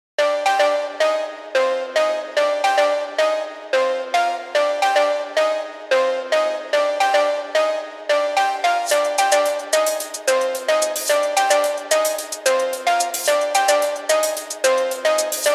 phonk; Skibidi Toilet

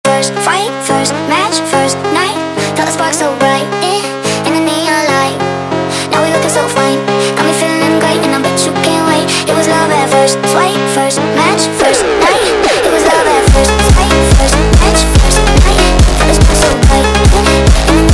Jumpstyle sped Up

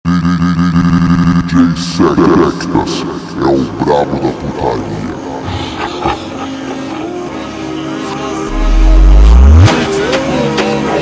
Funk Ultra Slowed

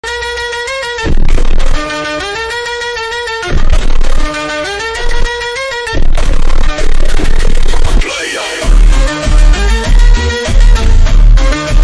FUNK bassboost

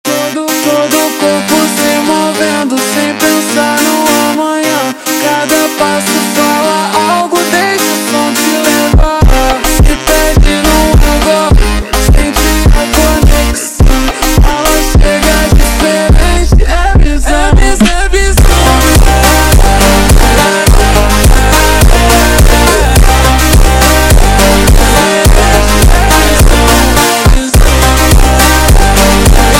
Funk super Slowed

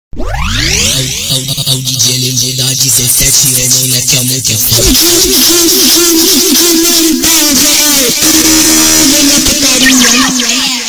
FUNK Sped Up

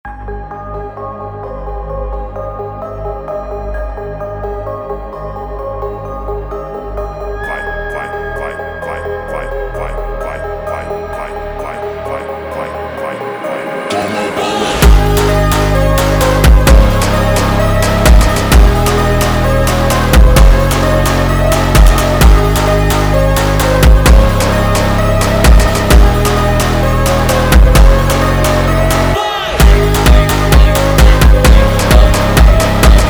slowed

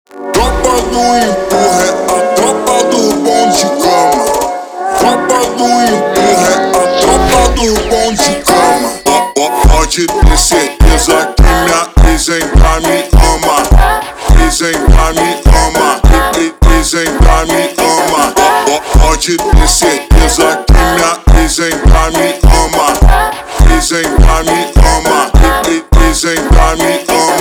Funk super Slowed

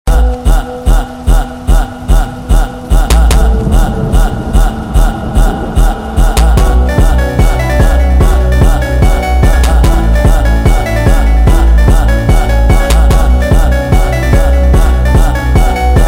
Funk sped Up

